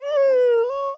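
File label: plaintive howl of a sad dog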